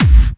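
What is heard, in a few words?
BD Clubby, bass drum; VEC1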